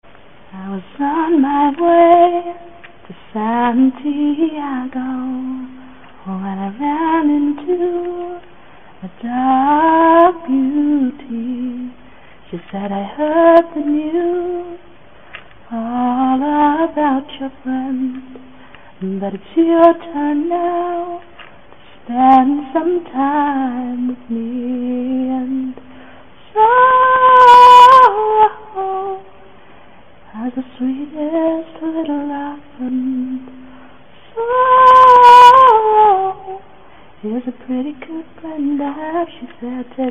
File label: lullaby